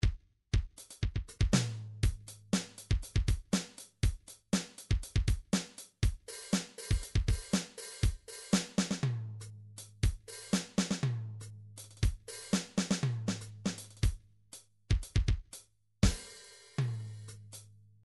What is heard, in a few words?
midi drums drums funk